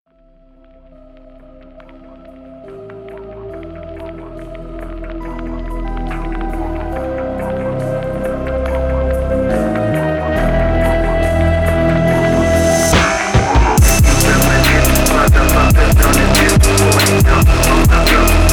PHONK

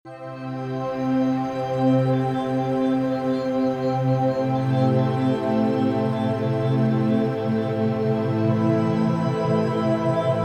Isochronic Tones; Binaural Beats Brain Waves; Brain Wave Entrainment; for Meditation

